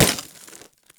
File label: Glass Bullet Impact; Warframe; Ambience; NineteenNinetyNine